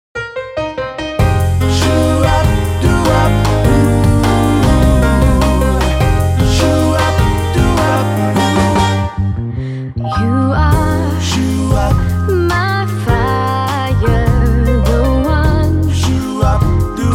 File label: Modern Vintage Swing Band